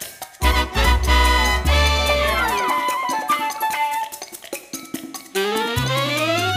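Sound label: food world music paradise suspens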